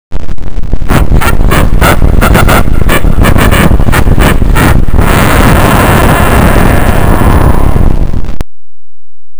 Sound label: trumpet glitched